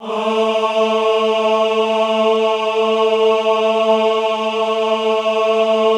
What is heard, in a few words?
AHH A2, Classical Choir; Best Service; Extended Classical Choir; AHH MIX